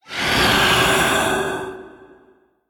Male Ghost Death

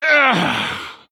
Male Night Elf Death

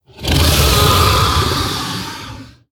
Dragonmaw Sky Stalker Death

